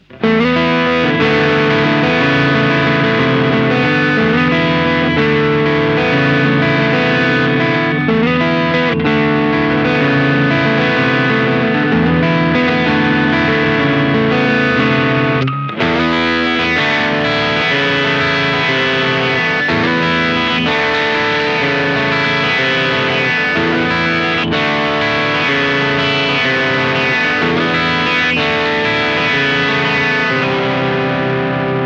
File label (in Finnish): teleriffi; tech21